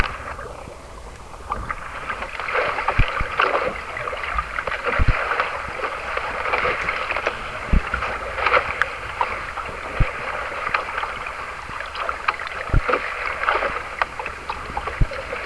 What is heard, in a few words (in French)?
bruit de l'eau